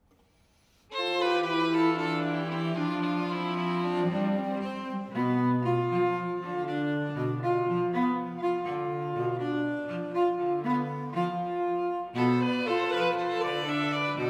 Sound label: String Quartet; 10 Preludes (String Quartet No. 1)